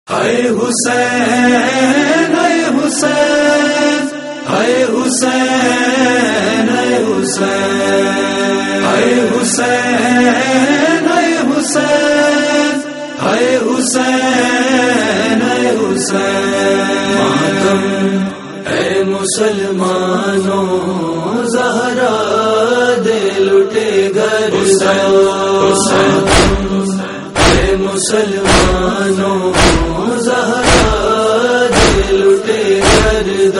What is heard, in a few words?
Punjabi Noha